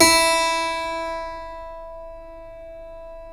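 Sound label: E3 clavinet left, Keyboards Acoustic; USB Soundscan vol.17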